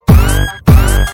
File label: ping ping sms